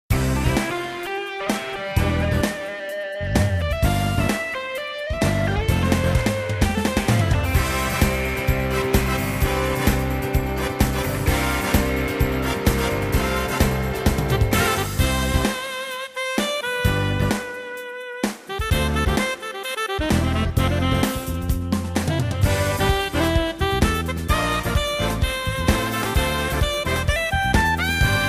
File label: Style - Live Soul Band